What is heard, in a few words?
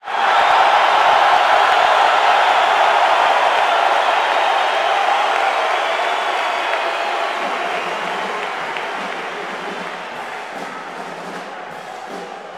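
Basketball Crowd Cheer; SFX Kit; Crowds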